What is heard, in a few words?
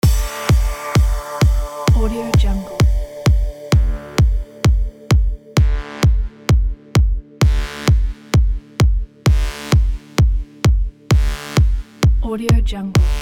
energetic progressive trance